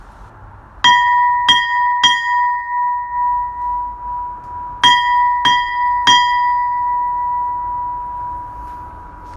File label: USN Bell Sound